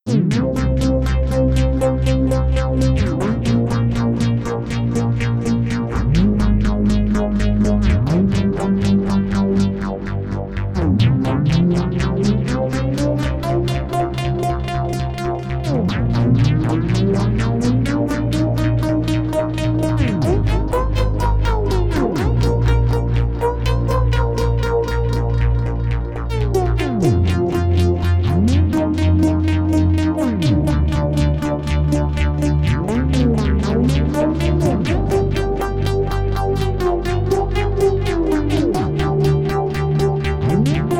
more delay and more decay

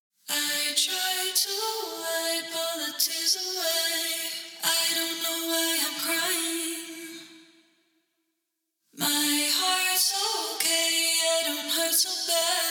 female vox, de-esser on

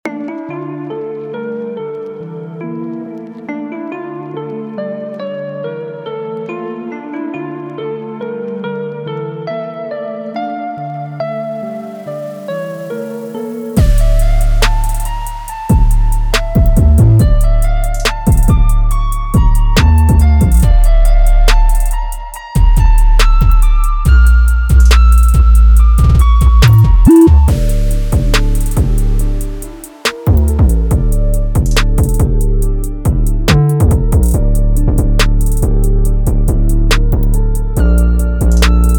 808 box

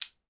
switch blade hard knife eject

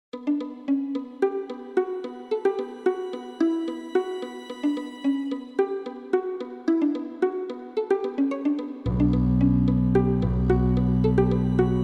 strings; the pirates; captains

